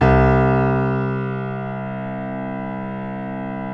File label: PNO F; Synclavier Sampler Library